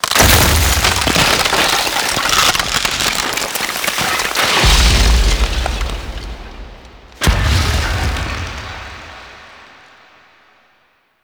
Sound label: Sculpture Ice Break; Warframe; The War Within; Mountain Pass; Oro